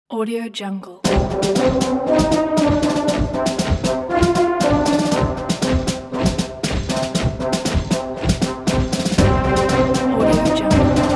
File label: epic march